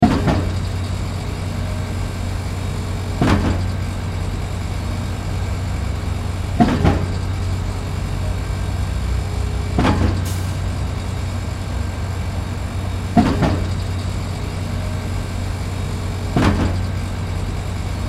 Stamper Press Construction Truck Compact Ground Loop; Industry